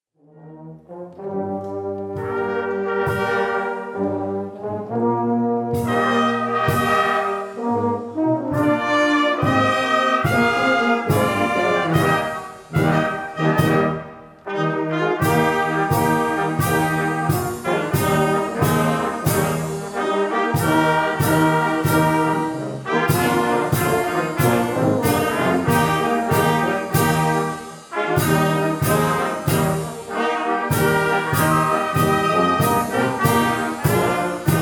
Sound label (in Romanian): Fanfara